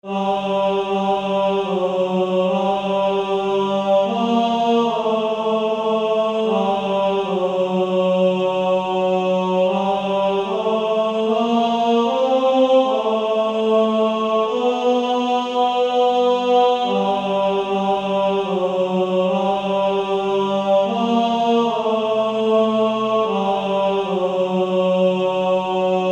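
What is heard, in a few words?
Male Choir, tenor 2, TTBB 4-part, Practice Music; Coventry Carol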